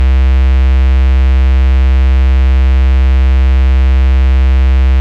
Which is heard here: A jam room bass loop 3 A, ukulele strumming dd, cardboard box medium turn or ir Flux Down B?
ir Flux Down B